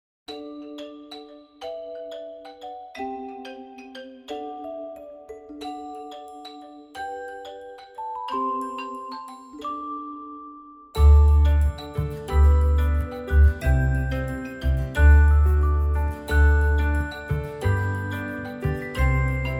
Xylophonia

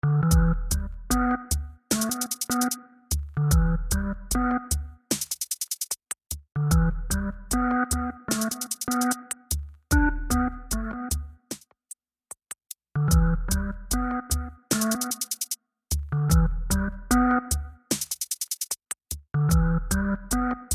A serious Hammond, not reduced

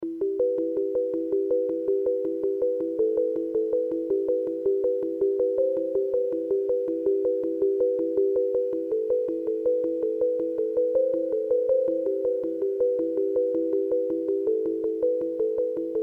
App Sine

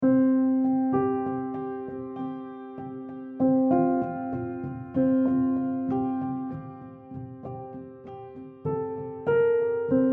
Calm down a bit reduced

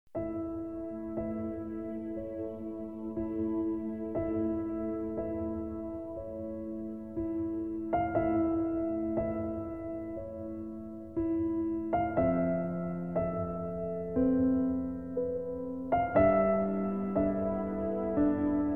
Piano Moods

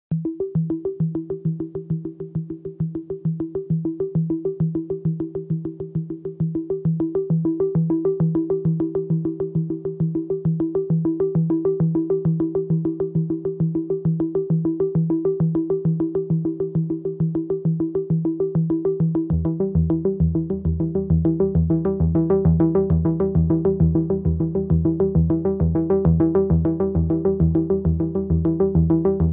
only Synthsequence

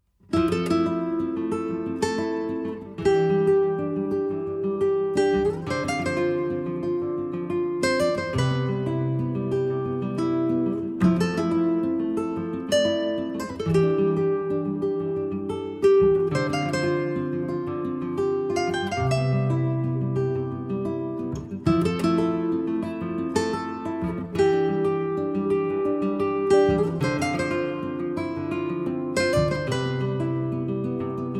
more guitar

